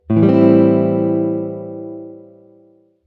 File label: amin7, chord